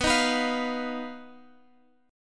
B Major Flat 5th B Guitar Open G

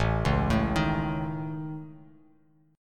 A Major Flat 5th, Bass Standard; AMb5; arpeggiated; JGuitar

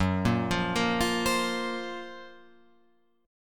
Gb Major Sharp 11th, Guitar Open F; GbM#11 arpeggiated; JGuitar

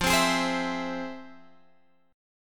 F Minor Major 7th, Guitar Drop D, chord

